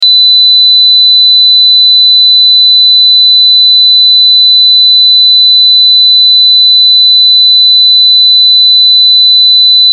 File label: 4000Hz 10sec; LG E975 Optimus G; lgeSounds